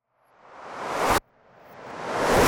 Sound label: reverse gun shots